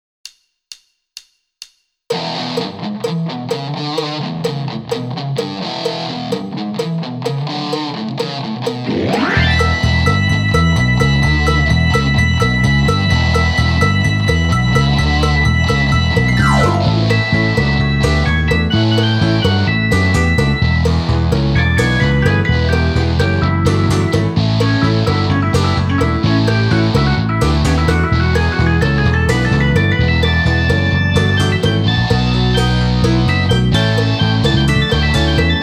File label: Backing Hard Rock